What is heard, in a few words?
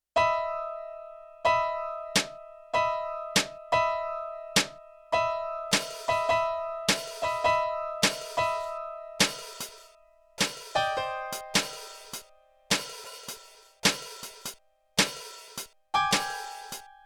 Drums Piano Split; Yamaha TX16W; Jazz Performance; Jazz Performance 2